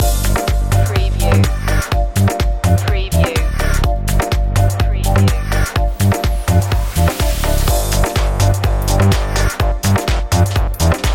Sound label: bass loop